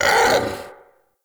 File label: Battle Dog Pain